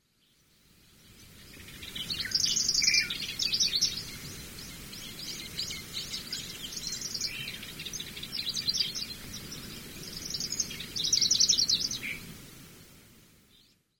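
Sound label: Pallas's Grasshopper Warbler; yonaguni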